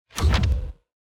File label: environment building upgrade low